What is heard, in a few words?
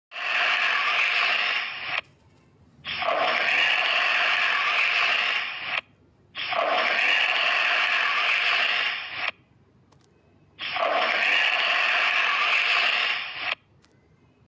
Bengal monitor